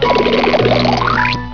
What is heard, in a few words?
run; svencoop; duckhunt